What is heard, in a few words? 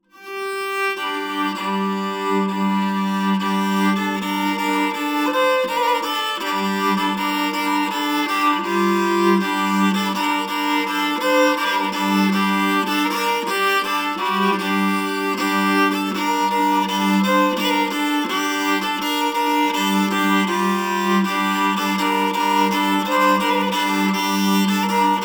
Crwth